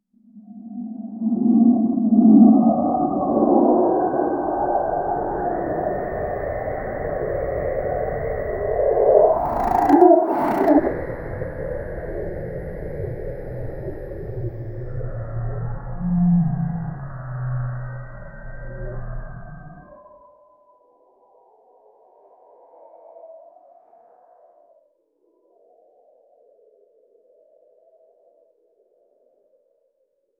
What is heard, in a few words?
create an adventurous sound